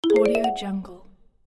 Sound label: marimba up